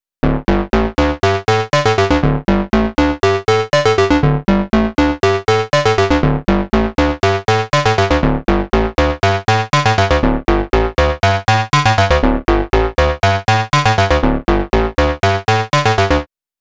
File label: ring modulator